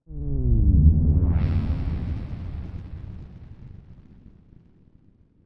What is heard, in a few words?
events sounds; 0°N 0°W